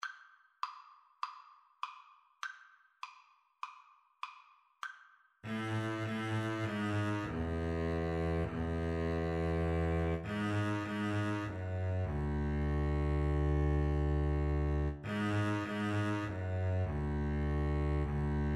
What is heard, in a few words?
Double Bass Duet